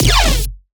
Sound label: Riddim Bass Shot F#; Dubstep; Riddim Basslines; Ghosthack; Advent Calendar 2019 Day 17; Riddim Bass Shots